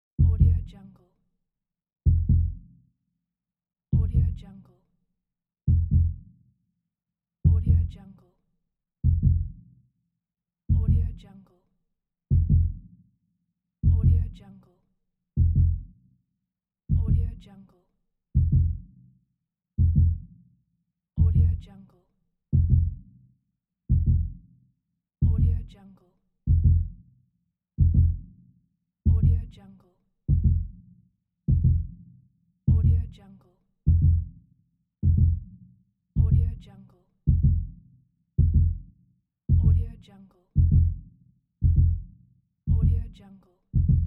heartbeat speeds up

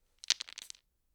dice shake in hand; prosoundfx

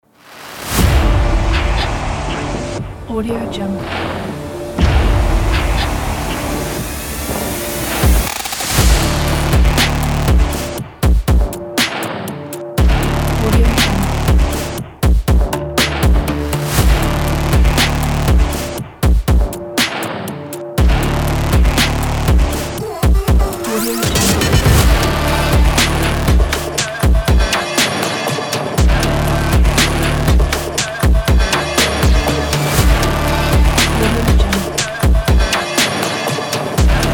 abstract glitch trap

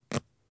cloth rip halting

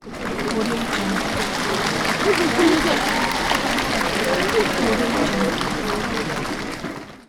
applause

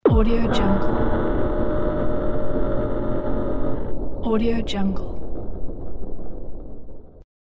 impact rumble

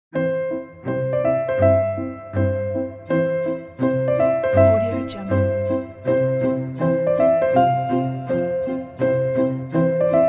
happy piano

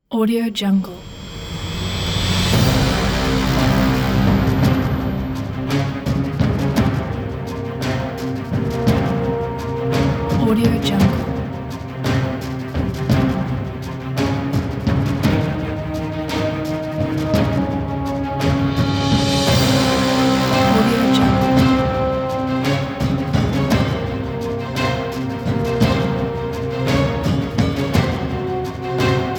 action cinematic trailer

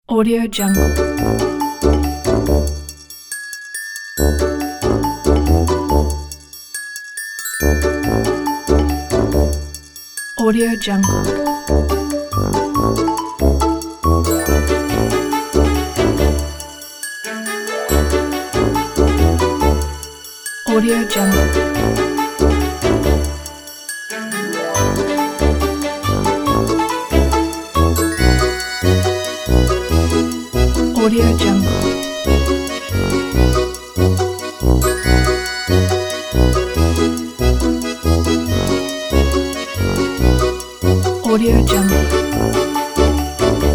quirky comedy

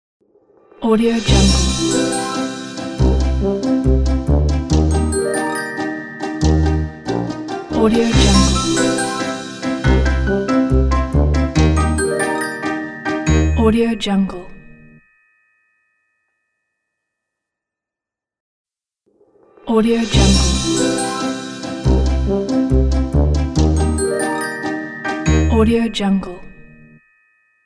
cartoon logo

